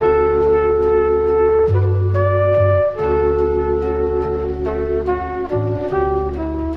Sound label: horns, jazz loops